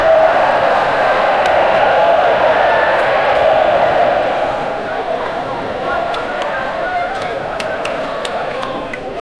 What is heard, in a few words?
dull thrum of a colleseum, arena